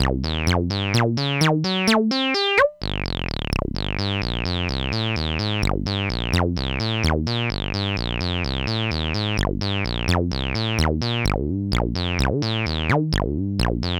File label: saw